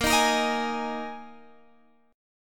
D sharp Suspended 4th, A sharp

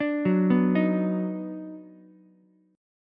Eb Minor Major 7th Sharp 5th, down, Ukulele; EbmM7#5; arpeggiated; JGuitar

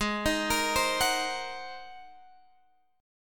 G sharp 9th, Guitar, Open G

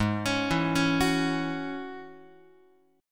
Ab 7th Suspended 4th Sharp 5th Ab down Guitar; Ab7sus4#5 arpeggiated; x,4,4,2,5,5

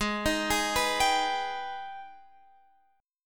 G sharp Minor Major 7th G sharp down

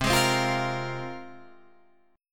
C 7th Suspended 4th Sharp 5th, Guitar, Open F; C7sus4#5 strummed

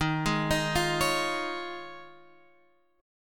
F Minor 7th Sharp 5th, Eb

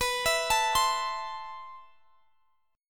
A Minor B, guitar; Am/B arpeggiated

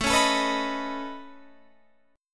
Db 7th Suspended 2nd Sharp 5th A, chord shape

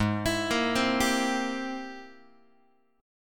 G sharp Augmented Major 9th G sharp Guitar; G#+M9 arpeggiated; JGuitar

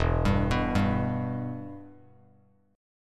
E-Minor, Bass Standard; arpeggiated; JGuitar

